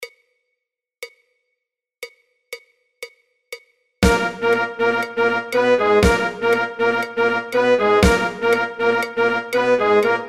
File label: Techno 120